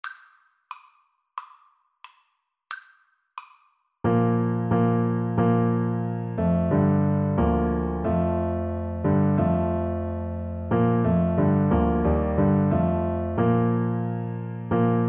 Bogoroditse Devo, Trumpet; Rachmaninoff, Sergei - Bogoroditse Devo from the Vespers

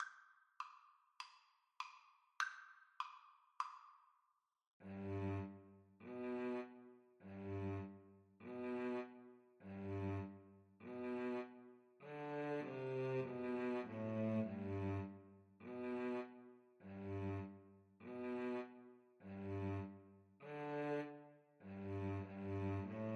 Cello Duet

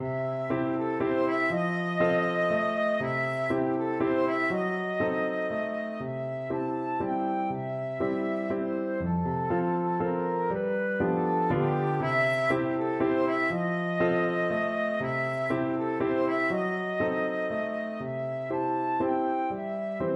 pams fancy, flute

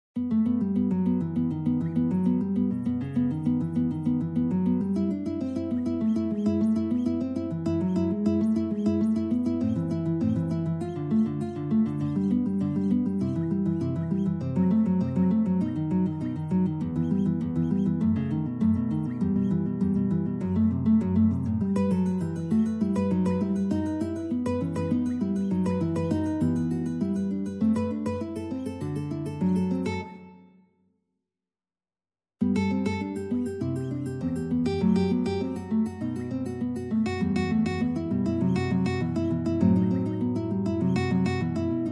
toccata, guitar; bach

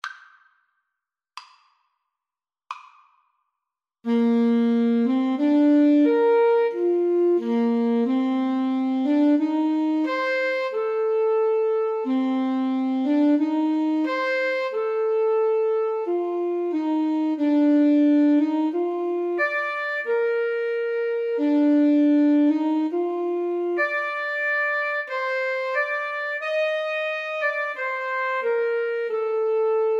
jodler, Alto Saxophone Duet

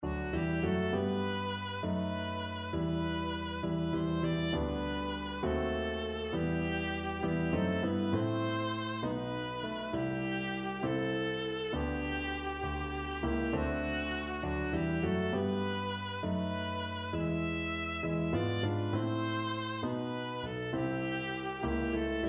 viola

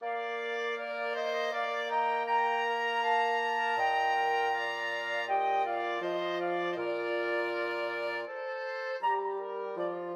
flexible mixed ensemble, 4 players

